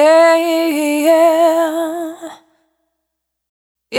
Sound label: Urban, Single Voice Melodic Loops, Vocal Samples